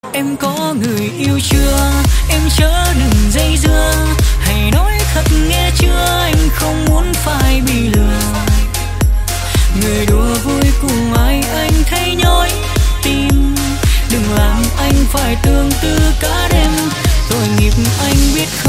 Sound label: Remix TikTok